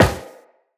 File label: Run Metal, Footsteps; Tenno